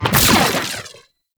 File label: Hourglass Pickup; Warframe; Duviri Murmur